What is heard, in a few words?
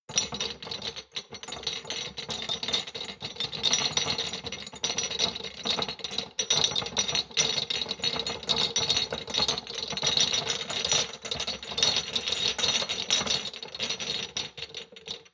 shot glasses rattle soft, gastronomie; berufe; soundpool